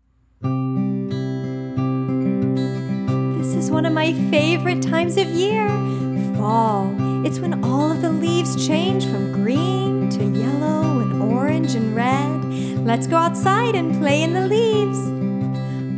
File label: Mix with wind